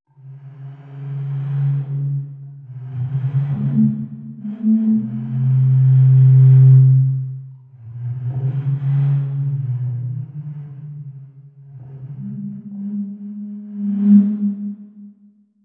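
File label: tuba; wallander instruments; wivi player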